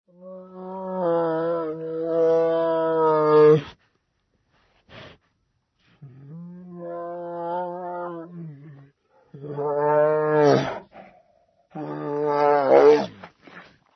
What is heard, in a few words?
ANIMAL TIGER